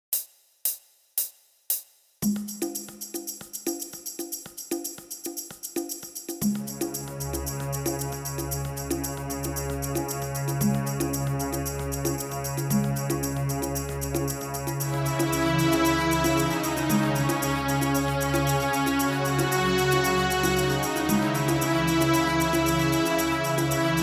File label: Guitar Twilight